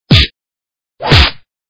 Hit Punch

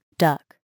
duck

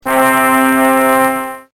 trombone

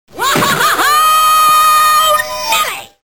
laugh

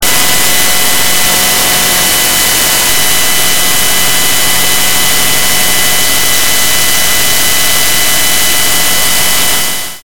noise

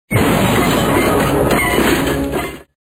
crash

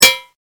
cowbell

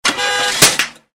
car start honk backfire; Stereo

